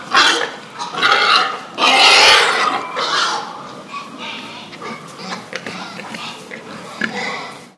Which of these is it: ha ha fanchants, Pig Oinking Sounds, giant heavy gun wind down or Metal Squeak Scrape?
Pig Oinking Sounds